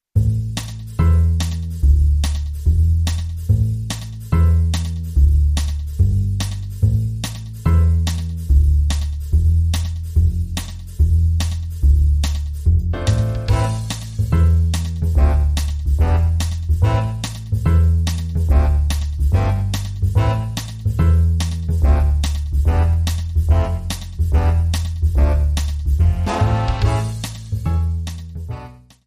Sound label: big band swing